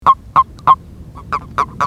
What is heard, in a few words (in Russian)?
zhaba seraja ili obyknovennaja